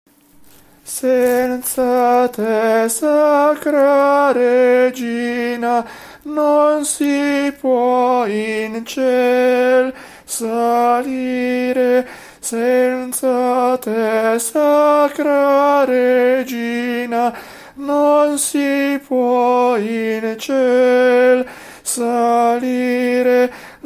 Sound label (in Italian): soprani